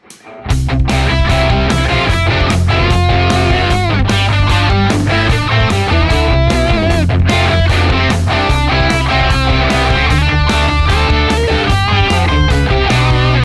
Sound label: rock guitar